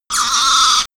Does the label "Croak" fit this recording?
Yes